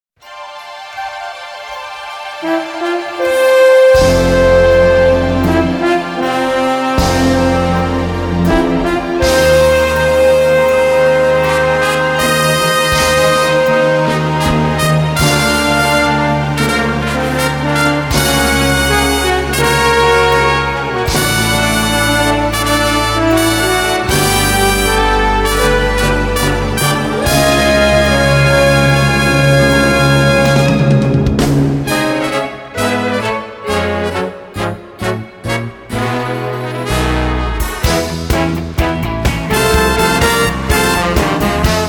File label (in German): Ouvertuere Instrumental